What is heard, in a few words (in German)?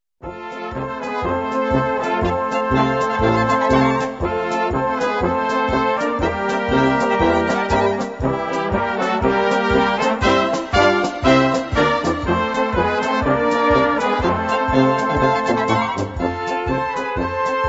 Polka